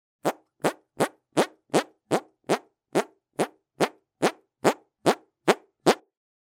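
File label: fart sound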